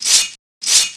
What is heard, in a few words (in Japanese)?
zakuxtu